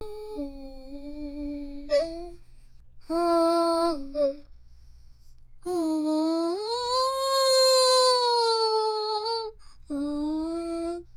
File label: a little girl grunting an; female